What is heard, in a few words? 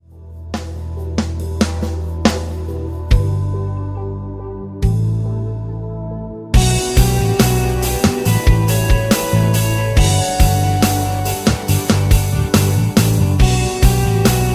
rhythm